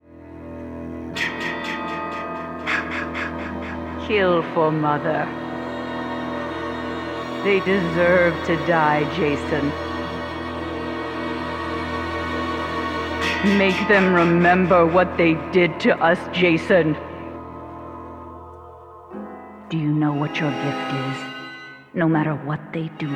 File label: music; singe